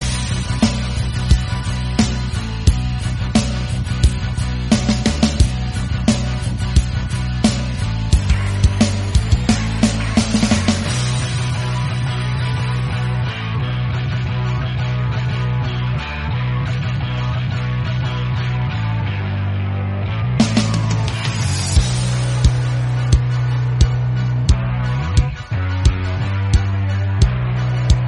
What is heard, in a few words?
Instrumental BGM